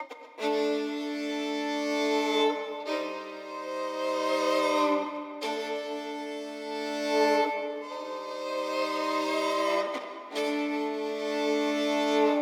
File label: Violin levare; raji an ancient epic